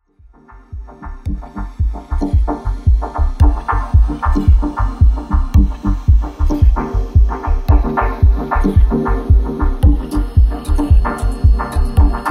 pebble

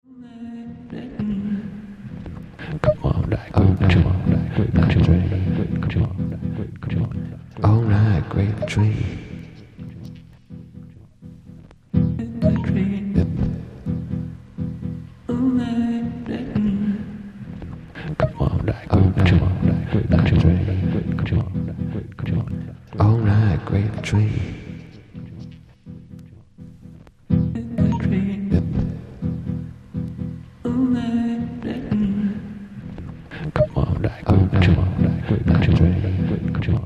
acapella

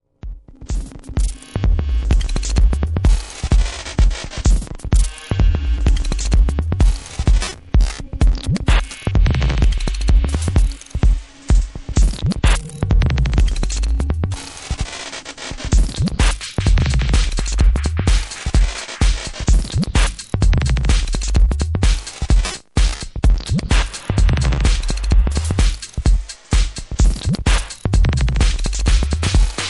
drums

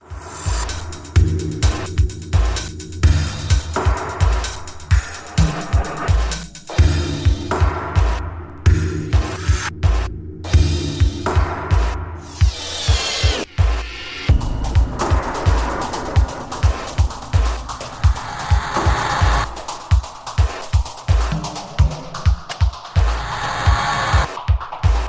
crash

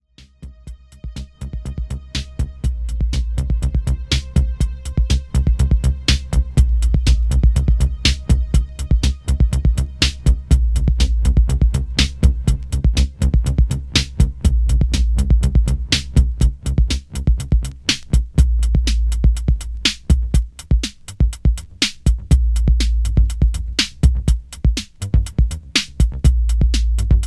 electro mix

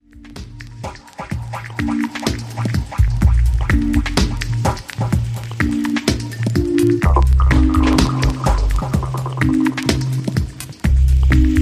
organ attic sings blues

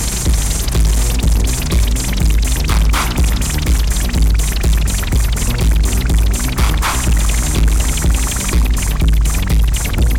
sci-fi dub

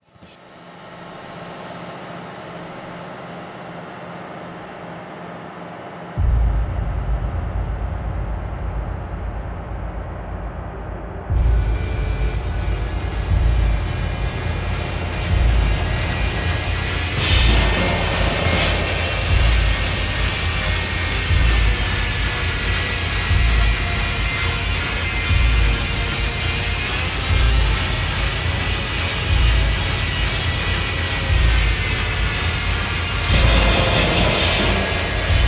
drone

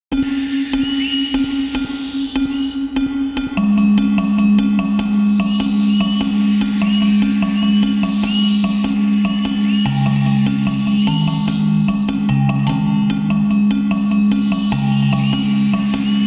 gamelan sketch

